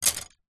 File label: foley wood skewers handful drop on wood surface; zapsplat